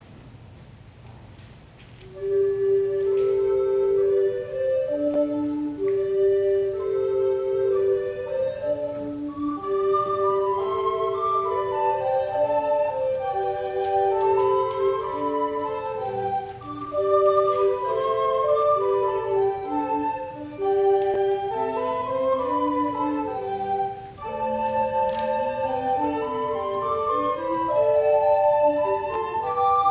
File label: Recorder Ensemble; I Saw Three Ships